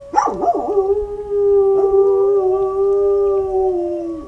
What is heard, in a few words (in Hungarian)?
éneklő kutya; mosonszolnok; parasztudvar